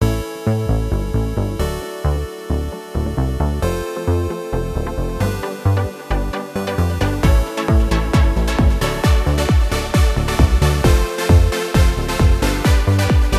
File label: MUSIC; Scene Demos